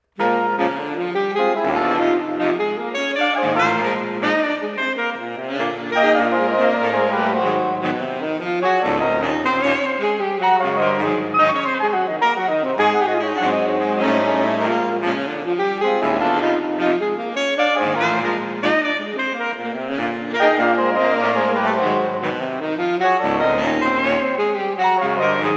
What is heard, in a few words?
Sax Orch